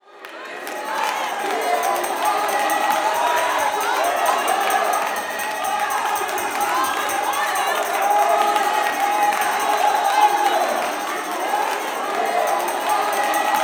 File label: WORSHIP, WORSHIPAMB, Vocal Planet, Gospel